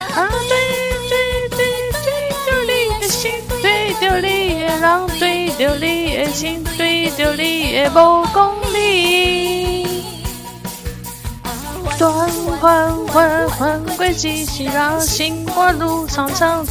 song

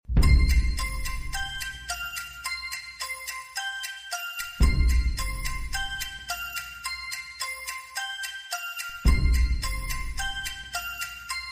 question timer

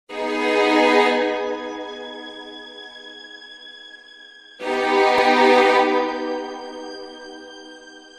waltz

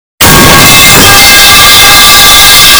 metal pipe but way too loud

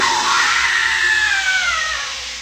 monster scream; pizza tower; toppin

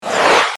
iphone swoosh

